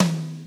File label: Tom; Percs